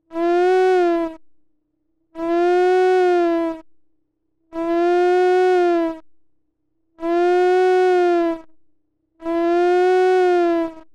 spring filter Q HI GAIN; Snazzy FX; the eternal spring filter; Weinen

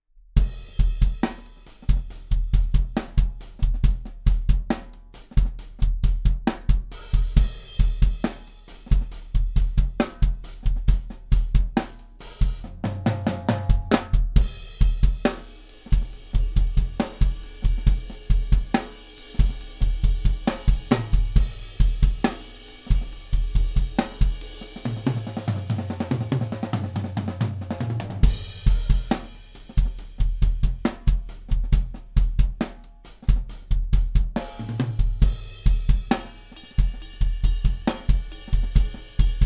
Drums; UA Apollo Twin

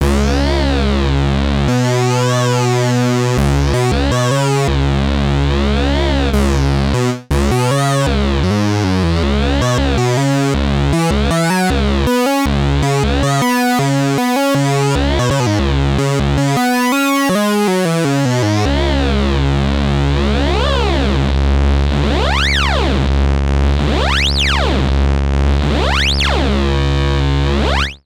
Moog Sub25 Hard Sync Modulation

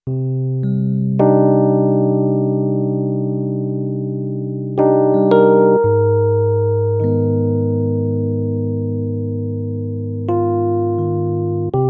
Yamaha P525 Stage Piano

